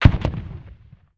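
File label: explosion medium; ModernWarfare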